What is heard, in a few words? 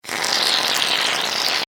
Household Mousse Spray Hair Product Short; Primping (Sound Effects:Human)